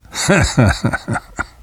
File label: laughter